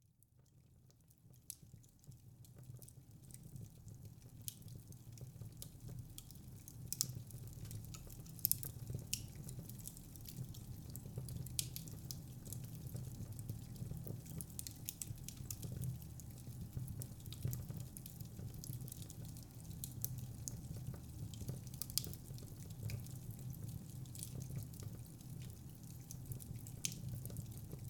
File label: Softly Crackling Embers